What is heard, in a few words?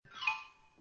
xylophone down; snow white